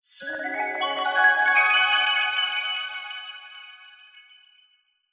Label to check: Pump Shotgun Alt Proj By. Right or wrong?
Wrong